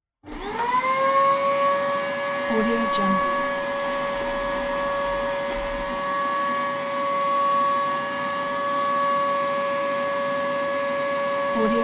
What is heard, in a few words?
vacuum cleaner; jdproduction